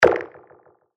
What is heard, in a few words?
B, percussion; Kousen; revealed percussion vol 3